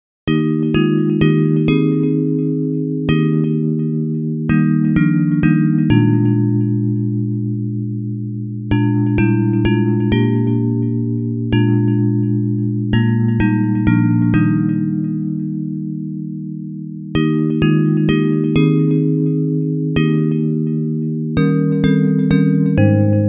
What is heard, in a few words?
Have Thine Own Way Lord Bells